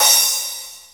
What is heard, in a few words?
CRASH, Dance Mega Drums; Best Service